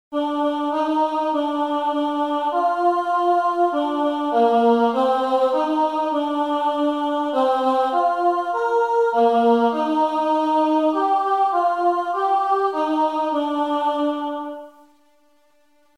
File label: Happy birthday Alto